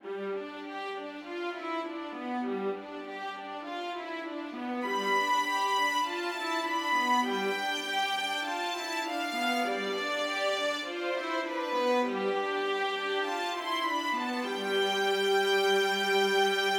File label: sustain sustain